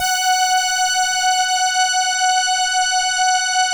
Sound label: ELKA, String Machines; ELKA RHAP